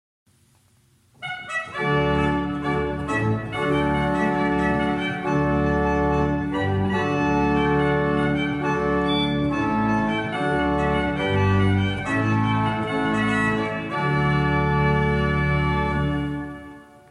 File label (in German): Trompete als Soloregister; Löbejun